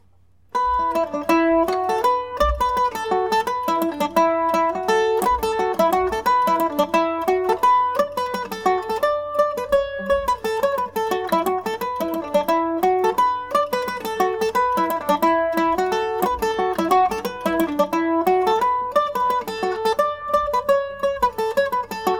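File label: E dorian md304, Irish mandolin; new mown meadows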